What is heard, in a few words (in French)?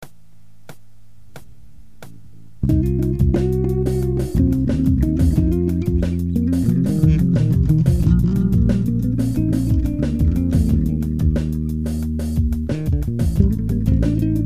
ballade